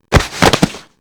faint

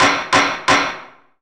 triple gavel

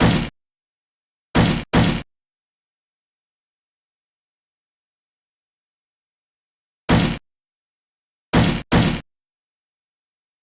slam; franziska

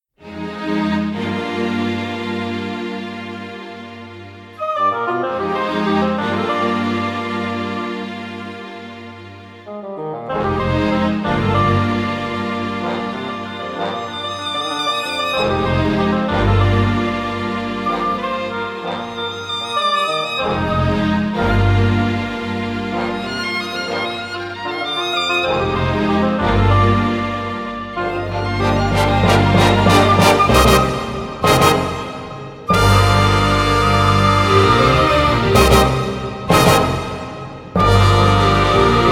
trial (orchestra)

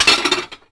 metal tap; olga

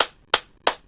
hands

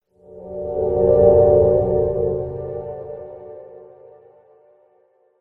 horror

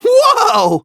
wohoah

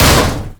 open lid; aura

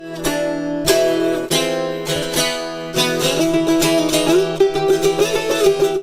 riff

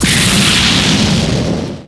flames

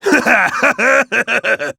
hahahehehehe!; nekomaru

